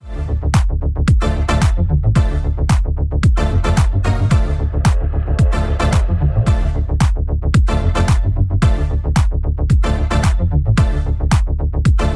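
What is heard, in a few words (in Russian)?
music for the car bass boosted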